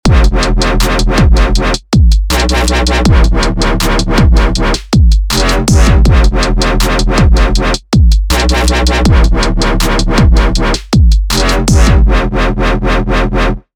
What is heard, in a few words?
System-8 The Wub